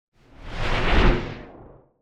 Whoosh Deep Wind; Hollywood Edge; sound designer's toolkit v2

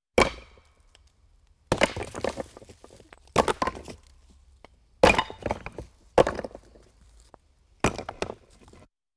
Walking Machinery Trucks

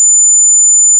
ear ring tone; DeadFright

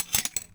Metal Rattle Grab Tongs; Foley - Rattles

